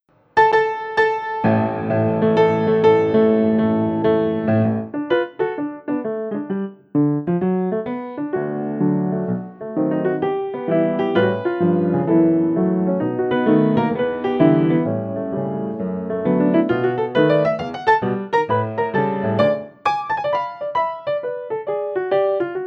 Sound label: Steingraeber Ragtime, akoustik piano